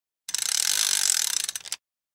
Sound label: thread; باز کردن قرقره